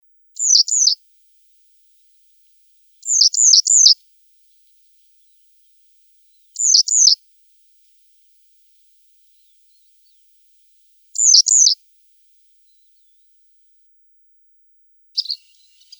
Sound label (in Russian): Trjasoguzka chernogolovaja